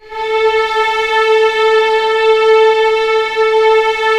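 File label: STR A3 FF, Orchestra Strings; Sound On Sound - Peter Siedleczek's Orchestra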